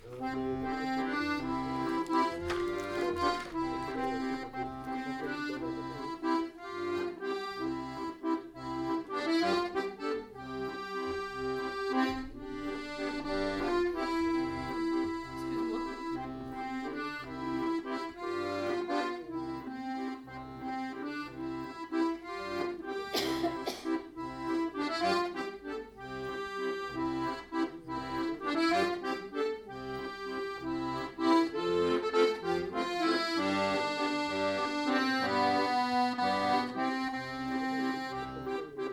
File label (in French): accordeon seul; les roses d ouessant